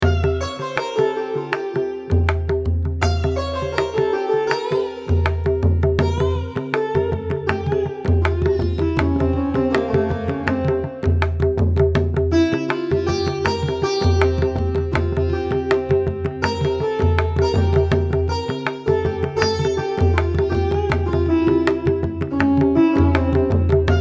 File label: indian sitar